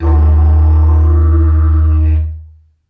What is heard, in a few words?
Didgeridoo Kit Samples